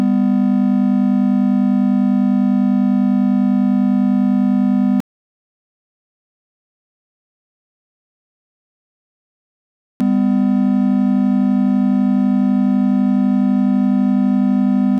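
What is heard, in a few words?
3rds Pythagorean equal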